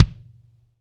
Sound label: KIK, Real Kiks; Club-50 - Foundations Roland